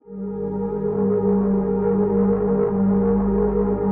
122 BPM Pads Dmaj Cmin Tonic G, ambient synth pads